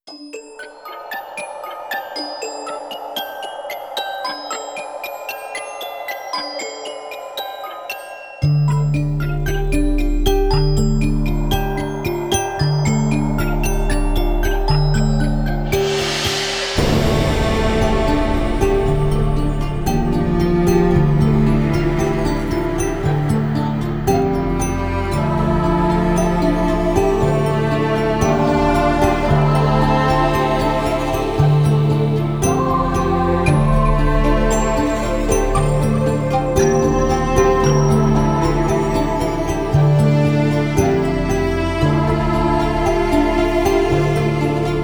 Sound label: Zombie - Lullaby Cello